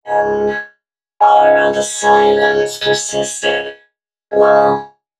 female c#, Dance Ultra Voice FX; Mutekki Media

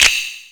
Latin Layers, drum machine

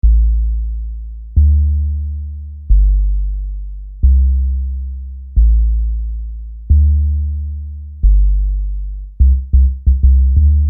Low Sub

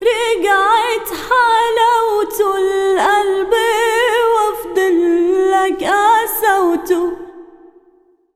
SINGER A, Arabian Traditions

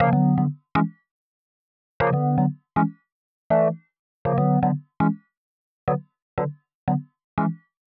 120 Rhodes Riff Chords E, Keys, Nu Jazz House; PowerFX

